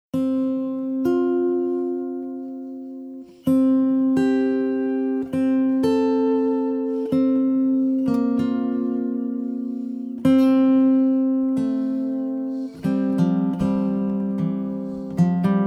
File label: Hymn